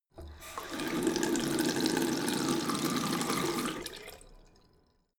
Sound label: tap flow medium short; haus garten